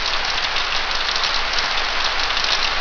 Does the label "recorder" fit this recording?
No